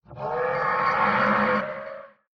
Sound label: death, skeleton horse; mob; Minecraft